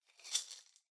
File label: Maracas Noise